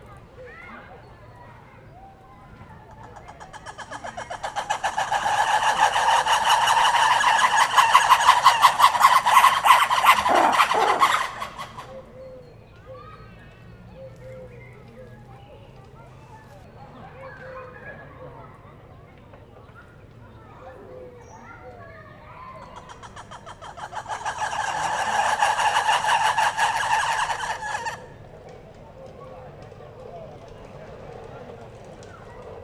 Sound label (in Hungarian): kórusban, vörös vári; akg; mono; allathangok; veszpremizoo 2013